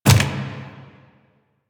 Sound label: Turret Dry Fire; Lasrian APC